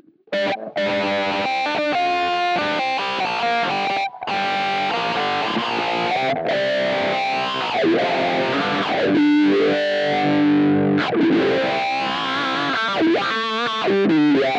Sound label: Cry Baby Drive; Zakk Wylde